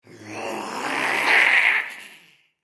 Monster Goblin, Horror, Monster, Goblin, Small, Snarl; Monsters